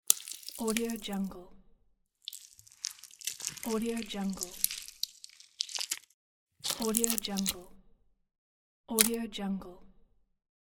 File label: blood gush